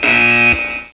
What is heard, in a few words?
buzzer